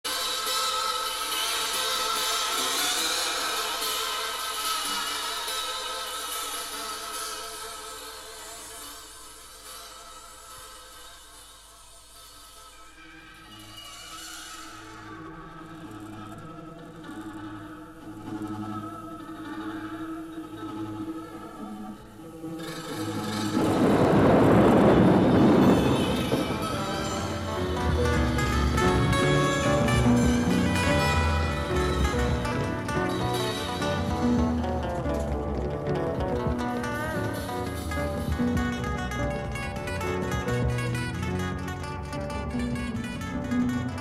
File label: Noise Electronic Music